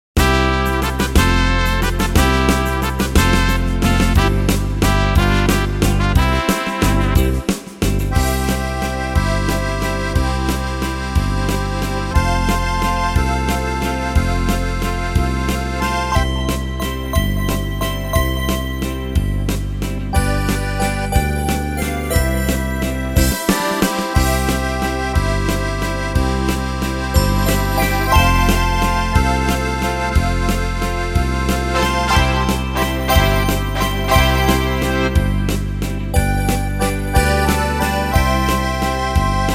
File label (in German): karaokeversion